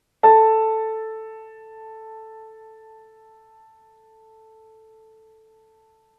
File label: piano octave A A